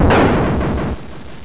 bombe

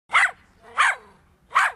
small dog; WMBonusXP

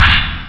ouch; axisdemo

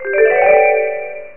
digi-harp

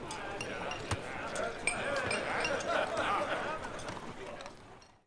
peasant; PHARAOH

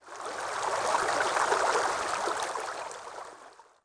WAVE, water; zones

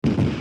BOUM

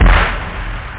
snare; da capo vol1; drums

